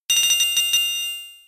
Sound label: Metal Sound; attack moves; Pokemon; Gen 4; DPPL HG SS